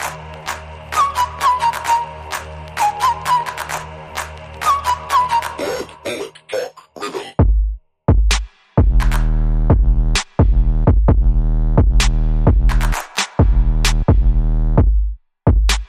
Holyshit, No Dropsynth

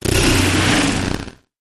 Gritty Static

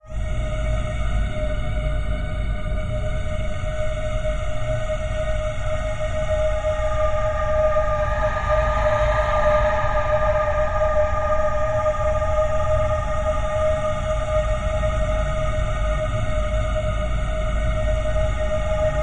Banshee Omen Atmo

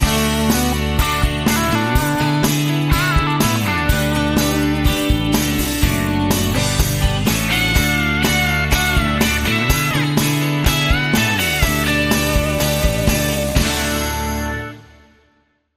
Guitar Central

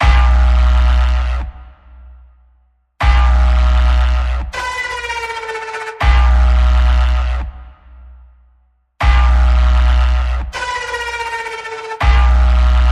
Mechanical Massacre Pulse B, Key of B

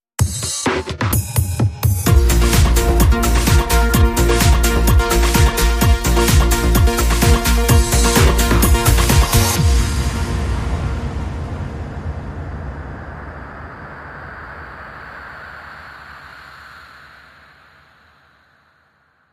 Bumper

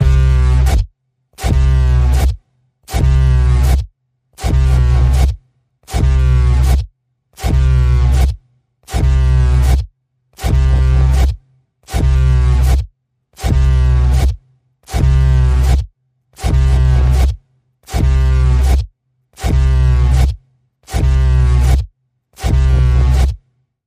Panning Pulse C, Key of C

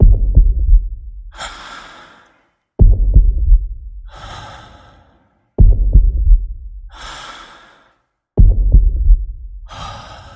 Athlete Heartbeat Breath Pulse

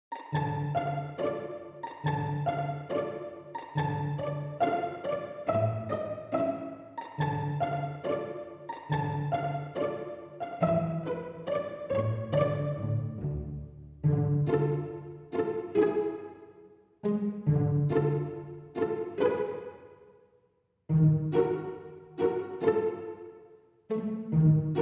Strings Only

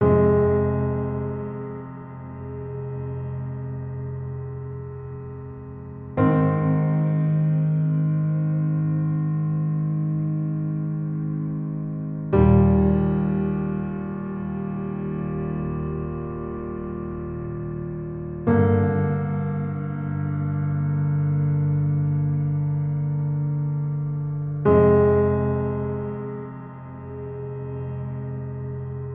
Mellow Piano Underscore

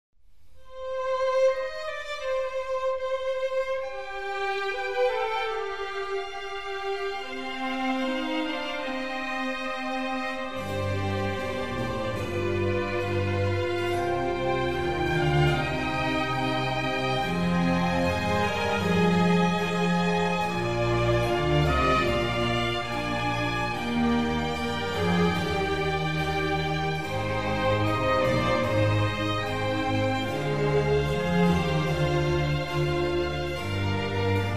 Concerto for Strings in C minor; RV120; Antonio Vivaldi

